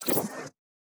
technology transition slide

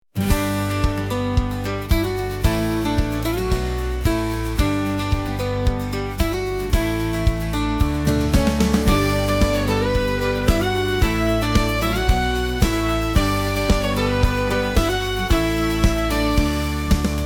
lofi chill background

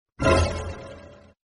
shocking sound

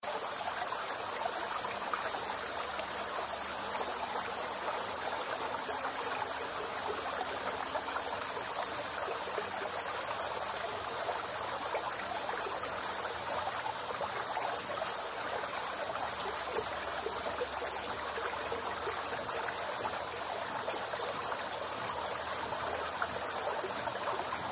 stream and cicadas

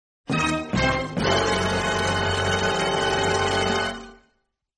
shock horror

